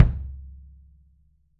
RUBB KICK; 3D Drums

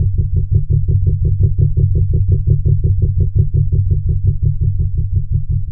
Noise, LFO & Noise

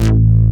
moog, bass; Ueberschall; Techno Trance Essentials

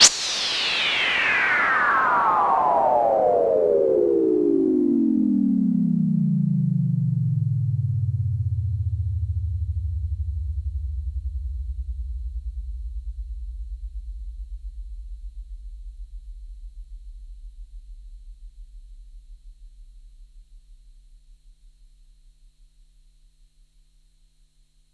SYNTH FX

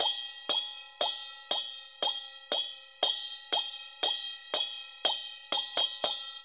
CHNA SM11 L, Heart of Asia; Spectrasonics - Heart of Asia CD2

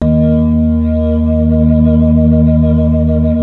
c3-f#3, Organ; WhiteShade

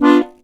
HOHNER, akkordeon

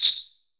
SUZU

